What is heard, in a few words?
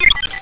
SWITCH